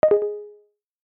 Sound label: message alert